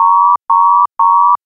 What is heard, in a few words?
alert